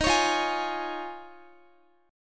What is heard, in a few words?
Eb 7th Suspended 2nd Suspended 4th Eb, Guitar; x,x,15,18,17,16; 4F,4A,5C,5G,5C,6E; strummed